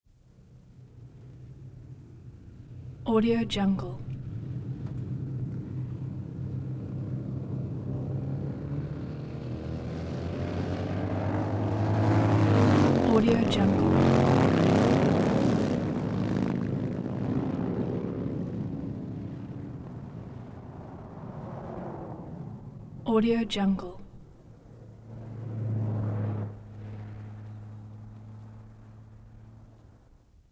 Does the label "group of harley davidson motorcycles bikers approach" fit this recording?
Yes